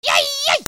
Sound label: CARTOON